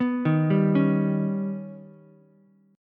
D sharp Augmented 7th D sharp, Ukulele; arpeggiated; 4,3,3,4; JGuitar

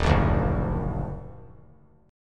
Eb Minor Major 7th Eb down, chord; EbmM7; strummed; JGuitar

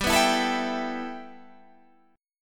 G 6th Add 9th G, chord; G6add9 strummed; 15,14,14,14,17,15

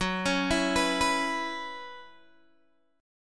F sharp 7th Suspended 4th, Guitar, Open G

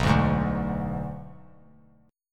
B Minor 6th B; Bm6 strummed; JGuitar

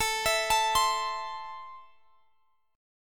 A Minor, Guitar, Standard; arpeggiated; capo 3; x,x,19,21,22,20

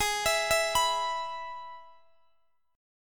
Ab Minor Sharp 5th, Guitar, DADGAD; x,x,18,21,19,21; down

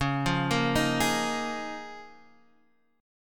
Db 6th Add 9th Db down Guitar Half Step Down; Db6add9

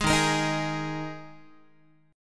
D sharp Suspended 4th, Guitar; D#sus4 strummed; JGuitar

